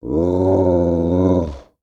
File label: Animal Mammal Carnivore Dog Doberman Growl; animals; AdobeCC